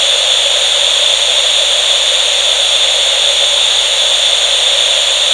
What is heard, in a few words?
idle steam